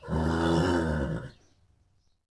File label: Horse Vox; Ambient; Civ4 warlords